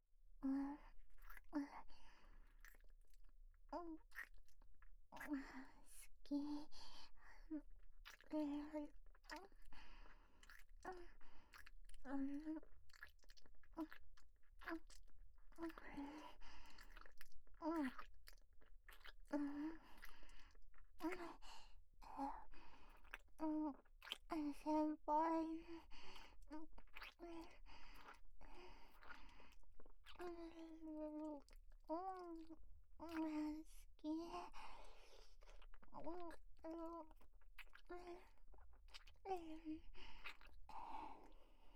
キス-ループ

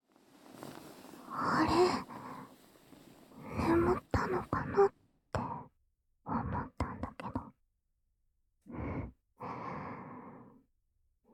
安眠ドキドキ耳舐め

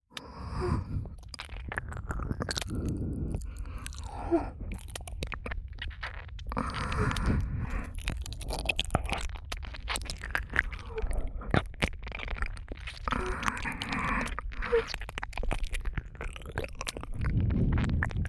(左)耳奥舐めねっとりエッチ, 黒耳 パンパン系効果音版

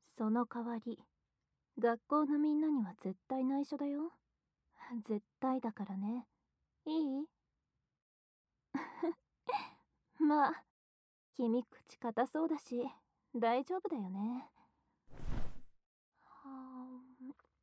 濃密耳舐め, ASMR; 内緒だからね？と言われてえっち大好き学級委員の濃密耳舐め

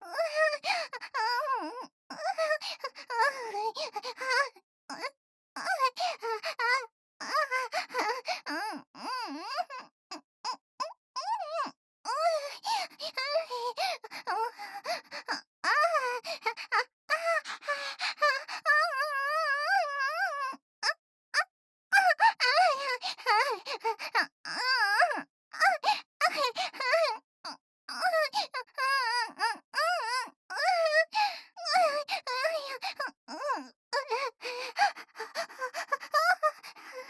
可愛くてエッチな喘ぎ声ループ SE無; オナサポ

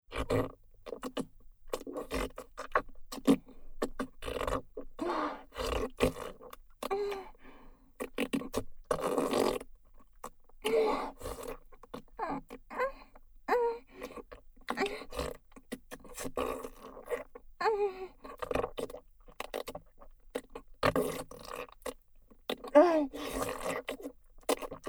愛情たっぷりフェラチオループトラック, ASMR

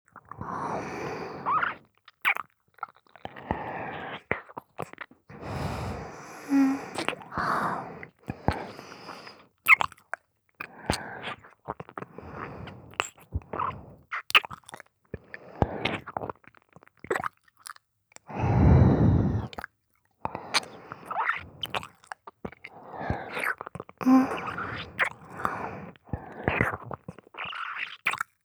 耳舐め （ゆっくり）

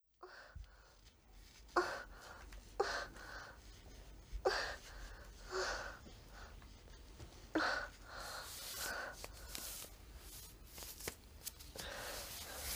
綺麗なお姉さん 喘ぐ; ナンパして即マンズリさせてくれた綺麗なお姉さんメス汁垂らして喘ぐ

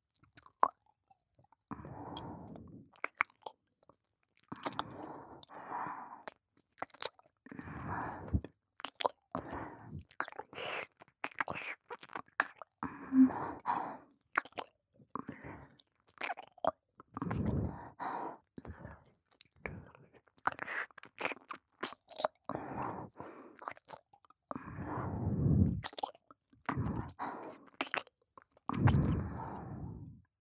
耳舐め 右, ASMR

